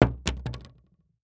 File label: Household Knife Butcher Small Hitting Hollow Wood